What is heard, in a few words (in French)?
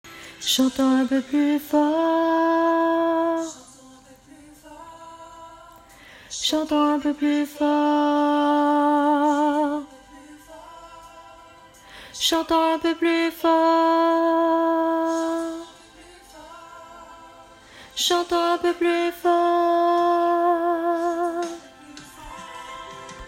voix mezzo; pont